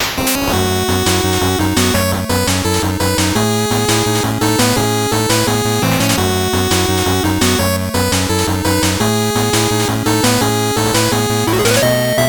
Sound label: 8bit bgm normal